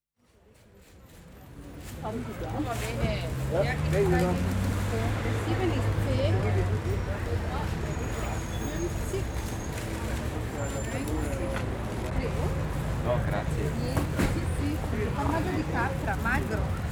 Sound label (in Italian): Mercato